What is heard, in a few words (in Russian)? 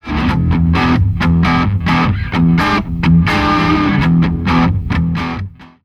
Gibson Les Paul Овердрайв